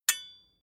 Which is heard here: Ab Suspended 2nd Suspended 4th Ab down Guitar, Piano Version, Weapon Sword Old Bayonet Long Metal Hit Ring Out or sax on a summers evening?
Weapon Sword Old Bayonet Long Metal Hit Ring Out